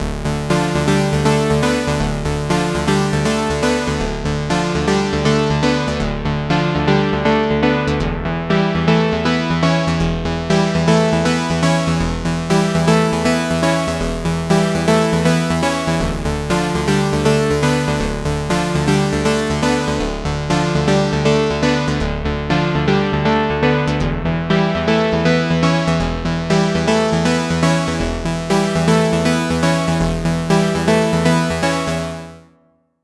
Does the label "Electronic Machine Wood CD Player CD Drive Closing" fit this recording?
No